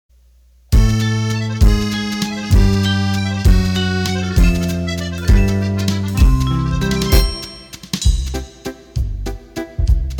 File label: Fast Waltz